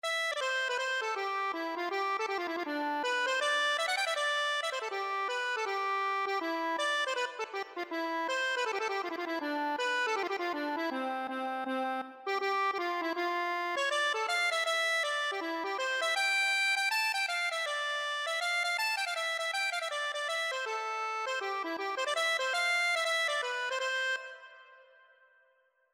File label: accordion, Irish Folk Song; Fair Ellen